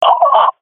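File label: death, swat female; vo; Counter Strike Model Pack; CS:GO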